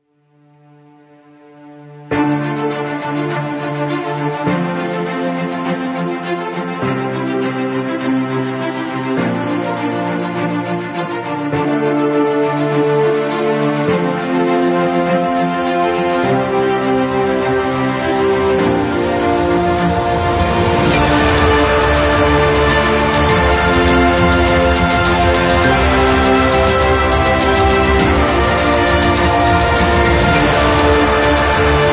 Epic Adventure

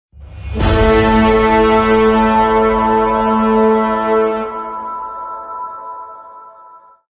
Epic Impact Logo Without SFX

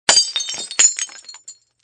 Crash Glass Vase

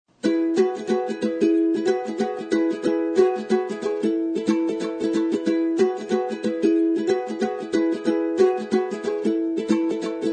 Uke Piano Bells Tambourine Only

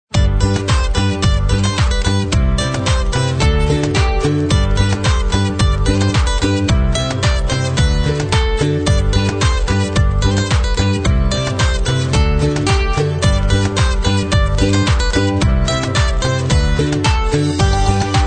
Fun Adventure Comedy Kids

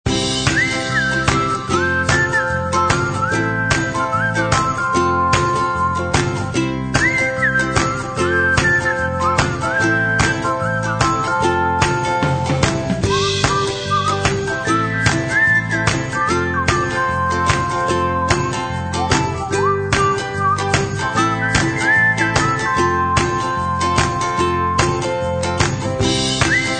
Ukelele Whistle Loop

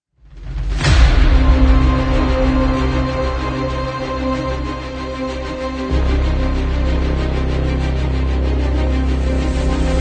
Sport Trailer Kit; 运动预告片套件

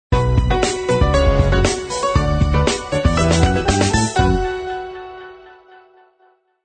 Confident Logo; 企业标志包 Corporate Logo Pack

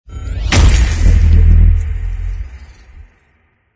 Apocalyptic Stinger Atmospheric Hit

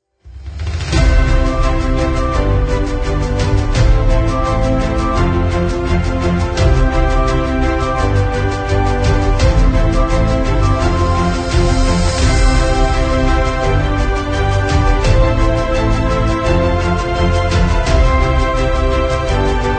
Epic Motivational Corporate

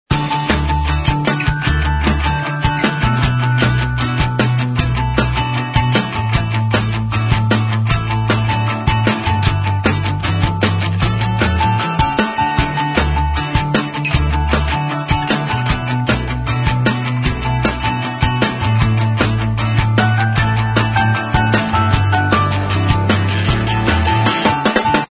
A Drive To Remember Main Loop

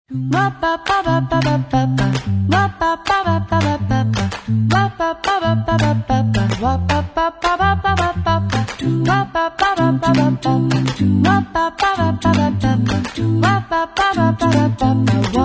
Happy Fun Upbeat Acapella

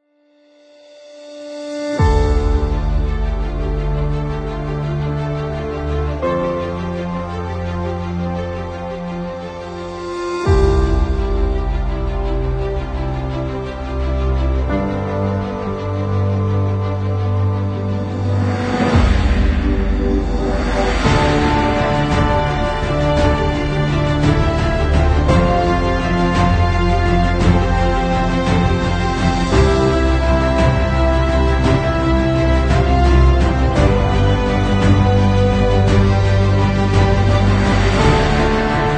Epic Inspirational Background

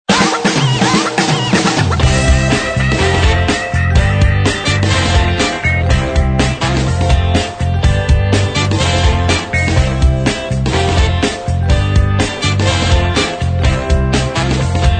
Groovy Kids Short

